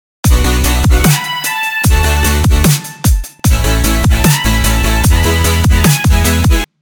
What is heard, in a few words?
Full sidechain